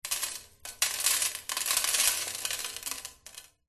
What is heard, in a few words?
stones gravel small sprinkle handful into metal container; foley; zapsplat